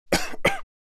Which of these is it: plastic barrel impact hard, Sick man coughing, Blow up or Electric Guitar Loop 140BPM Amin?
Sick man coughing